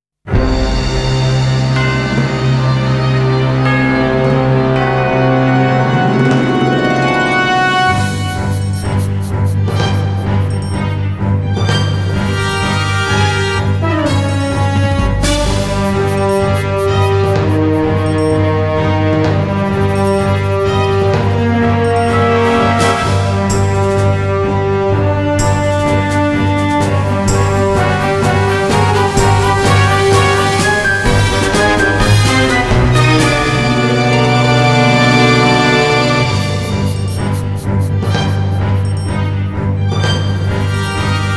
Monster Beat; Prinny

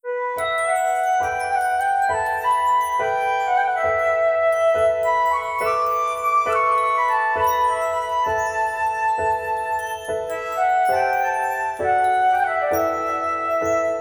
Theme -Instrumental ver.-; ODIN SPHERE; Odin Sphere Original Soundtrack

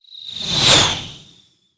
archer arrow flying

